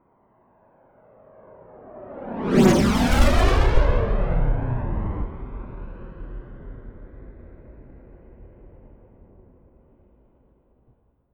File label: military jet fa-18 hornet turbine flyby right to left fast close